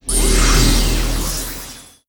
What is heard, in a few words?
Boss Appear; disney star smash android ios mobile gamerip 2020